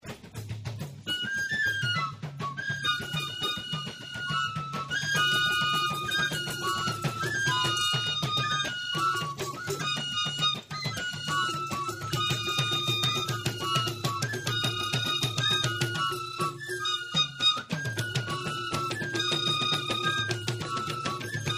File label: wua, kassena, ghana